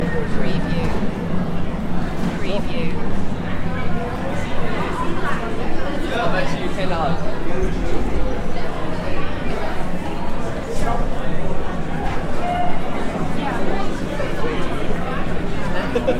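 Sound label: Arena Concourse Ambience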